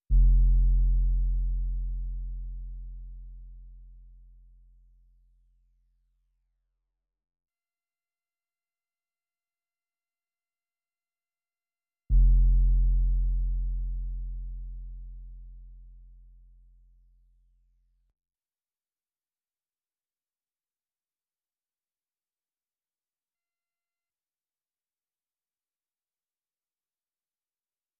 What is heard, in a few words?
Bass